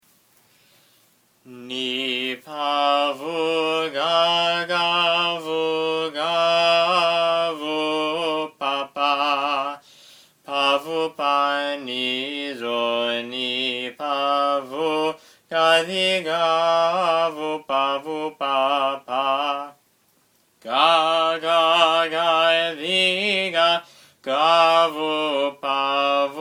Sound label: Stichera 6 - Tone 1 Slow; Tone 1 Parallege; Learn the Tones